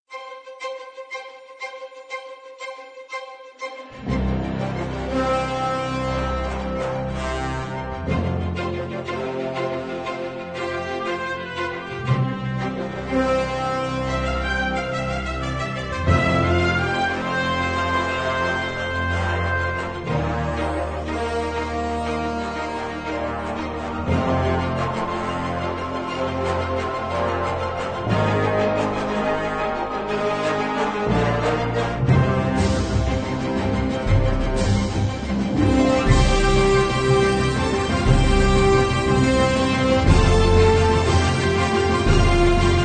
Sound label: Global Awards